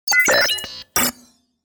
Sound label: Futuristic tone authorization error